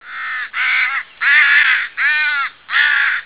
corneille noire, chant

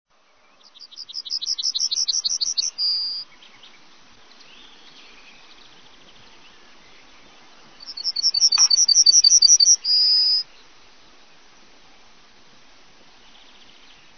Bruant jaune, chant

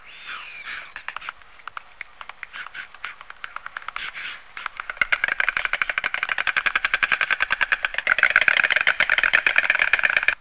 cigogne, chant

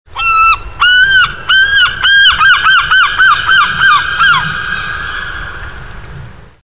goeland, chant

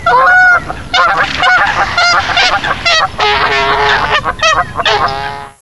bernache canada, chants

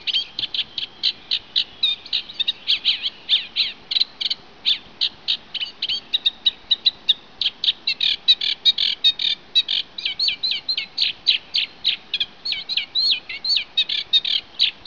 rousserolle effarvatte, chant

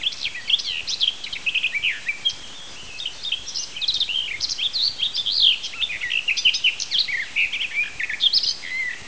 fauvette jardins, chants

